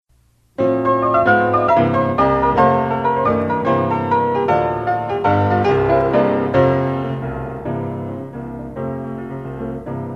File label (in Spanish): Excerto